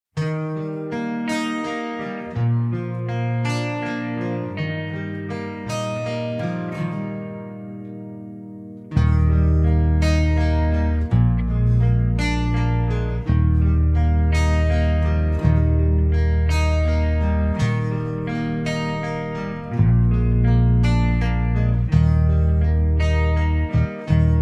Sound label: (Eb) No Backing Vocals